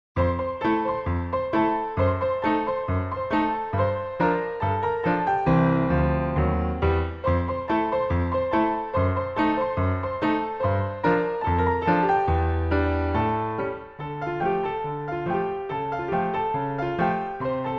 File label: Ragtime